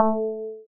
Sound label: E Piano